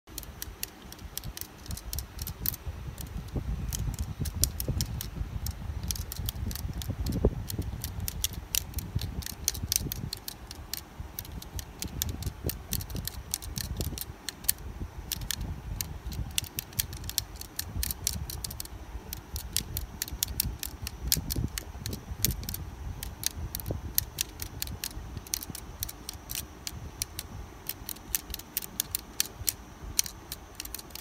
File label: abacus; چرتکه